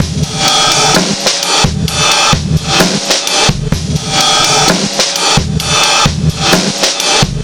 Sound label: Drum Break, Trip-Hop Loops; Sonic Foundry; Rondo Brothers Trip-Hop Loops & Samples; Hooked On Hookers